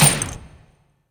Miss Coin; ClubFusion; CashBomb; CashGrab

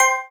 Win Flash; LuckyPunch; G-Star

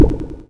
squelch; Twingo; ClubFusion

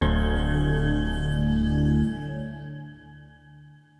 Incorrect; Take Your Pick

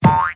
Gamble Lose; MexicanaChillitime; ClubFusion

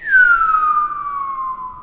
Fall; OverTheBrink

